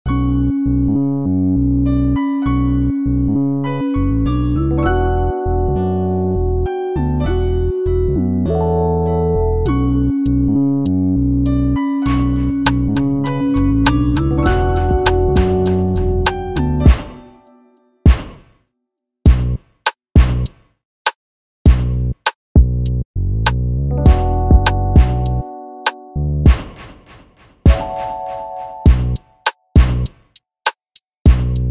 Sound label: instrumental